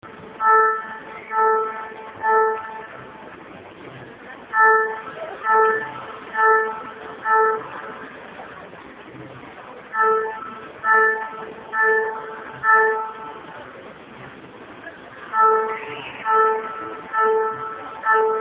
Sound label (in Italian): sirene